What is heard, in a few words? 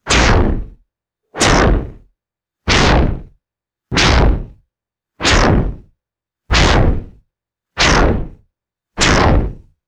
fast low synthetic punch whooshes; swooshes